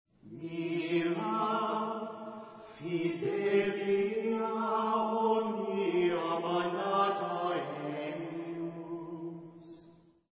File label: Mode 4 example; Fidelia